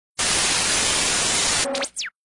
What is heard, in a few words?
TV Static Turn Off